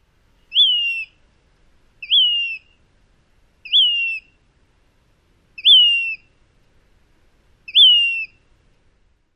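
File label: Say's phoebe songs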